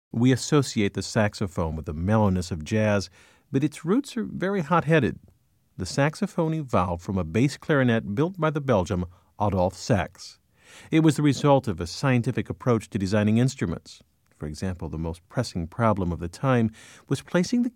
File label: sax